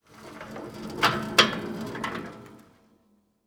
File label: Gurney Hospital Gurney Rolling Down Hallway Short; Emergency; AdobeCC